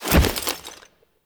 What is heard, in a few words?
Foley Jump; Warframe; Kahl